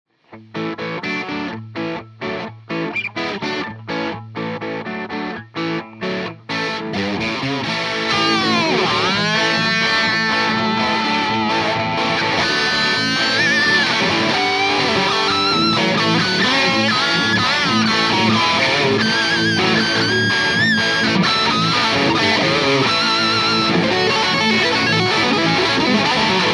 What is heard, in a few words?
Brown Strat